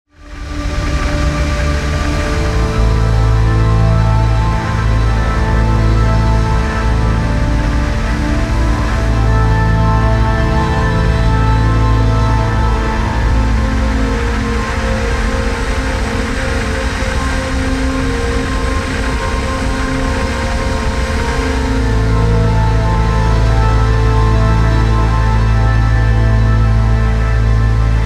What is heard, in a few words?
Music from Organ